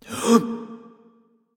nv breath; smite; xbalanque

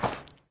Foot Back; smite; god bakasura

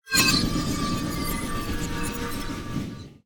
charging; smite; god atlas

